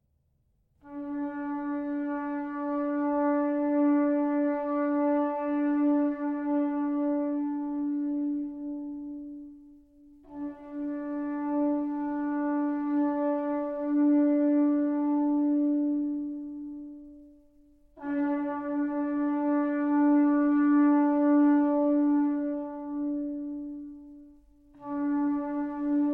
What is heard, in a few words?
Shofars Call